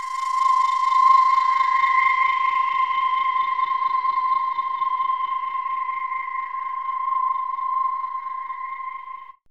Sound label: FLUTER FX; ATMOS